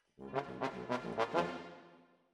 Trombones, jazz for piano

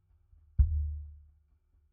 Sub

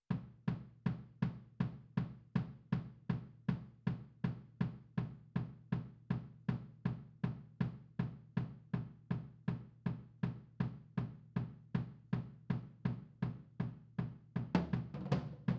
HiHat, 160 BPM

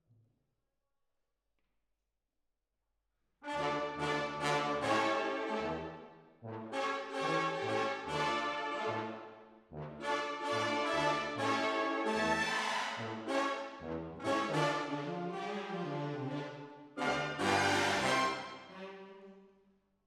Hall, Jazz for piano

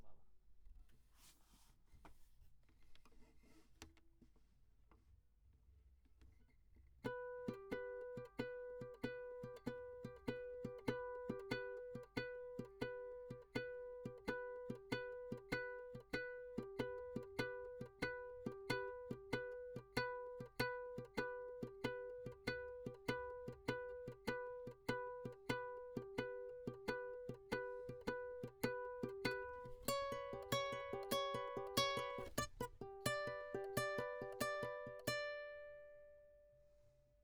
Classic Guitar

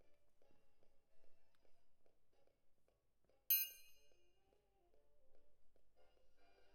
Perc Close

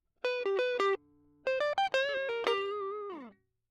Guit Solo; Line

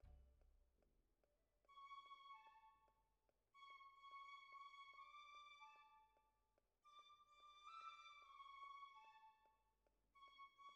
Cellos

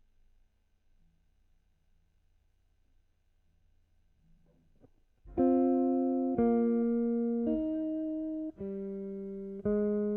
GTR

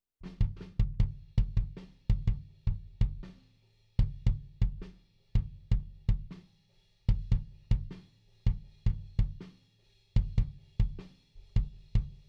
Kick IN

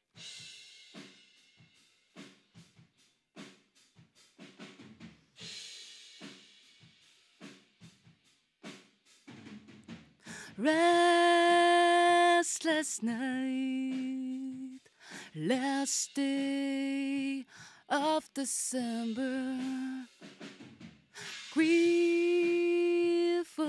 Vocal

